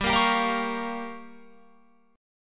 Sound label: G Minor 7th Flat 5th, Guitar Open F; Gm7b5; strummed; 19,17,19,17,20,20